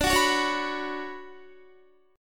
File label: D Major 13th D down Guitar 4F 4A 5C 5G 5C 6E; DM13; strummed; JGuitar